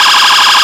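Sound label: emergency vehicle phaser, sirens; whelen std (uk)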